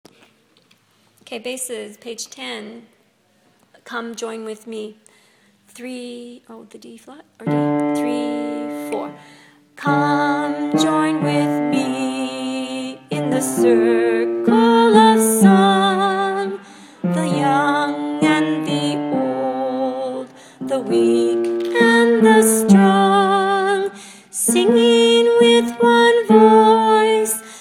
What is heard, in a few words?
Circle song bass